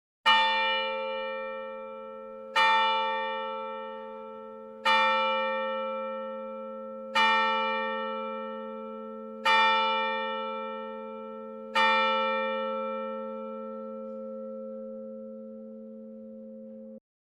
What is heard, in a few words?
bells